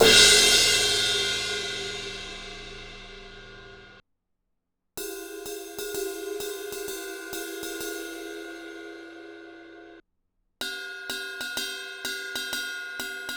sabian 18 inch aax x plosion fast crash ride bell

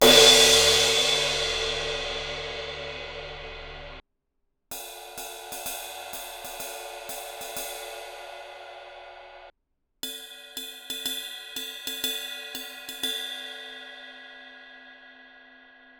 19 inch byzance medium thin crash crash ride bell; Meinl

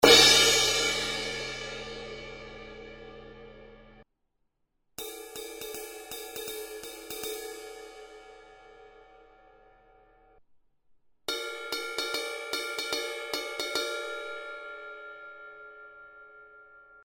18 inch hhx manhattan jazz crash crash ride bell; sabian

